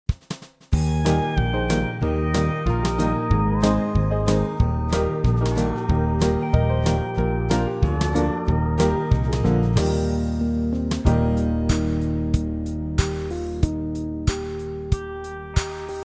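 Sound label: bass Piano Melody D, gospel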